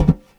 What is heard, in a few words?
metal vent, footsteps; player; Dystopia